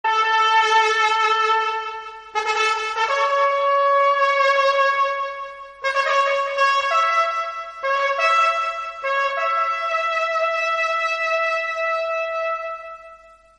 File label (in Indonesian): terompet apel pagi